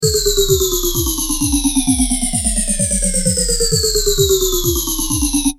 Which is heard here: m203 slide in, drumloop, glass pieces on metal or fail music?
drumloop